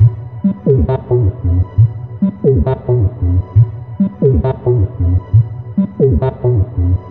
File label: Synth, Techno